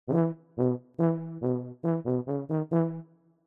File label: Tuba; mirumiru